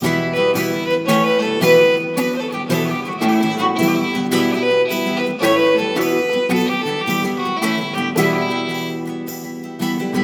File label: tavern; the crazy squirrel; ivan duch music packs